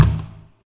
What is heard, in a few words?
Hit Baseball Bat Plastic; American Fugitive